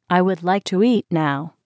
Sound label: eat